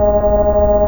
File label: server rotation loop